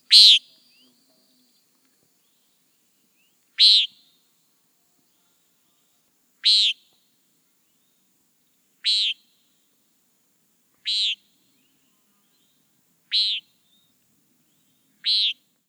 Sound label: Nighthawk peent